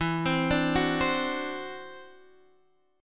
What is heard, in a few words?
E 7th Suspended 2nd Sharp 5th E down Guitar Drop D